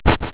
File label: swipe